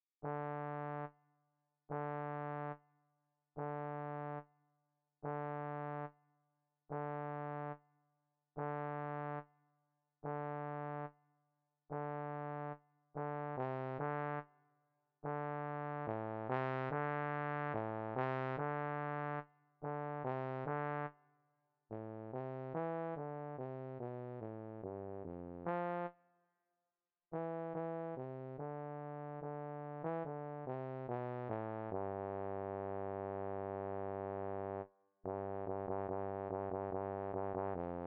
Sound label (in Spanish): bajo